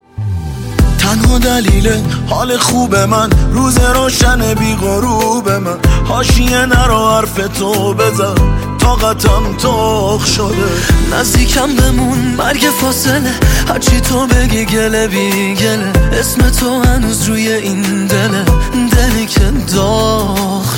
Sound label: Ringtone